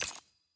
button click; lobby; strawberry

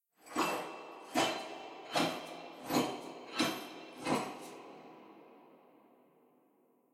sfx br anim walk lr normal

